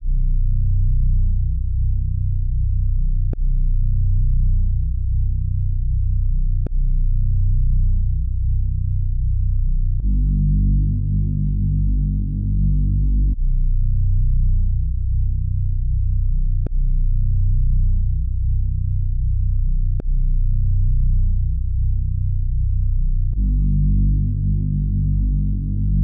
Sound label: 144 BPM B Min Bass, Drill; Melodies; Loop Stems; Cymatics; GHOST (Beta Pack); Break Out